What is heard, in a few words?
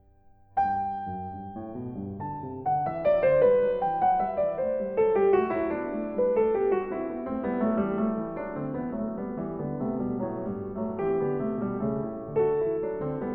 Variations, for keyboard